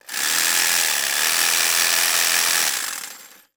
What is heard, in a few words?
Jig Saw; Tools